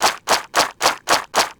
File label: pirate devour